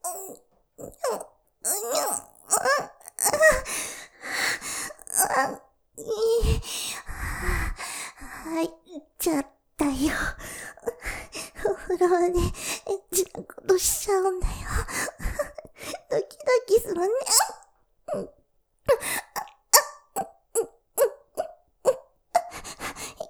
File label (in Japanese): 喘ぎ◆いい、入っちゃったよぉ、お風呂場でエッチなこと, ASMR; モノラル; ループで楽しむ！シェフの気まぐれ抜き抜きコース